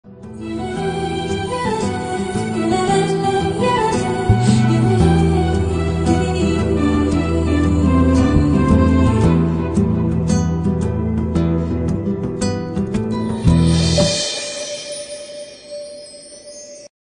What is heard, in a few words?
telugu movie background music; manam